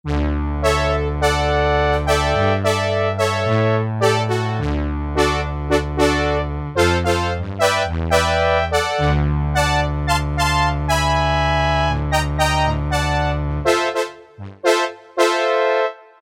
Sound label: Synth Brs 1, Roland U-220; Factory Timbres